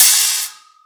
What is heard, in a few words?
dark tone open hat sound e key; Duration 0:01; Samplerate 44100 Hz; Bitdepth 24